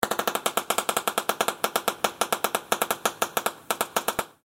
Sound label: Torch Pop Lit Malfunction; Lamps; INDUSTRY; Sound Effects:Household; Blastwave